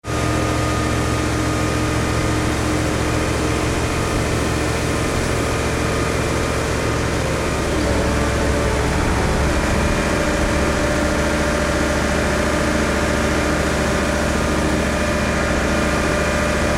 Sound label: crane engine close